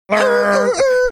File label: making air horn sounds